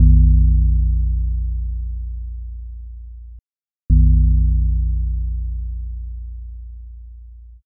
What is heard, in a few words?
belltoll